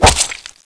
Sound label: knife hit, katana